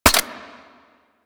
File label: Shot Mech; Warframe; Afuris